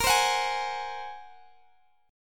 Bb 7th Suspended 2nd Sharp 5th Bb down Guitar Open D